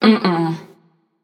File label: disagree passion; chaac